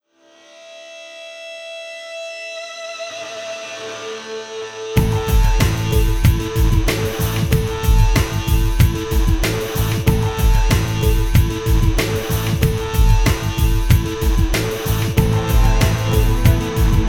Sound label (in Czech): na konci s dechem zkracena verze instrumental